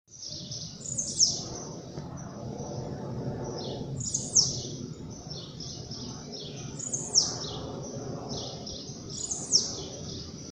Blue-black grassquit, Volatinero (Volatinia jacarina); EcoRegistros